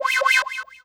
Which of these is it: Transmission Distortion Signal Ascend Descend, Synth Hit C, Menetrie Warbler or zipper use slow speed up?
Synth Hit C